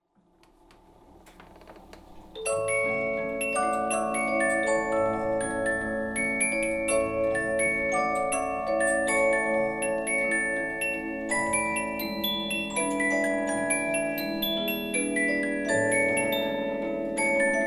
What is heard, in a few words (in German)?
Polyphon stille nacht; ORGEL